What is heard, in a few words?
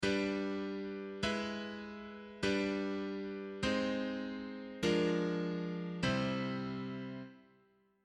Cadence